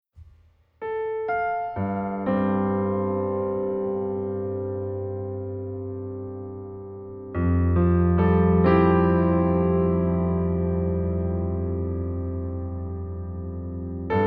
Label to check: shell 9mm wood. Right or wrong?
Wrong